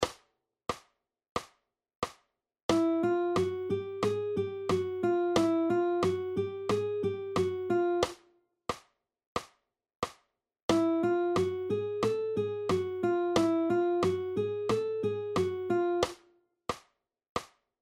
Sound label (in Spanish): Estudio Calentamiento, guitarra